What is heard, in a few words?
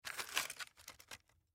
Toy Package, Toy Package Cardboard Empty Pick Up; Toy Package Cardboard Empty Pick Up Cement; Foley Toy Package Action Figure Plastic Cardboard Small Empty Pick Up Cement; Blastwave